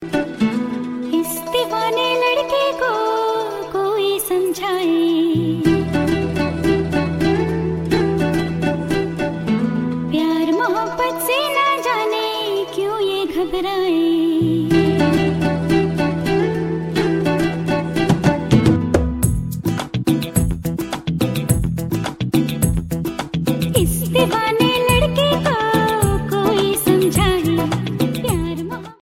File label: ringtone